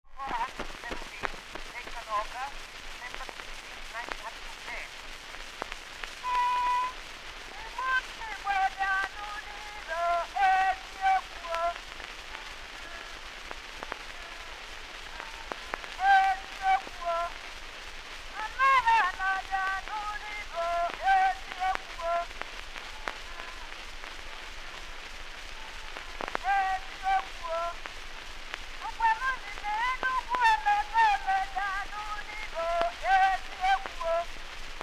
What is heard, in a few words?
A wax cylinder recording of the Igbo song "Igbo bu Igbo"; made by Northcote Whitridge Thomas